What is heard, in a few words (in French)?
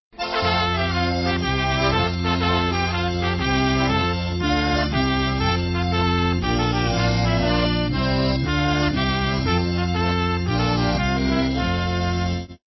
perrine etait servante instrumental